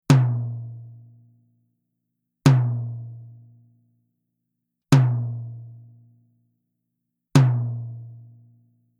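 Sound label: TOM Reso Black Hard, drum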